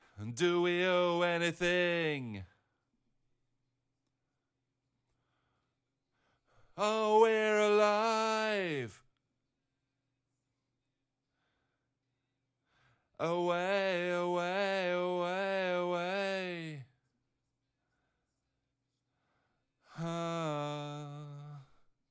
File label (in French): vocals back brut